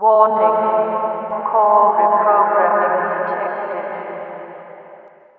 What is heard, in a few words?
citadel core reprogramming; hl2 ep1; ambient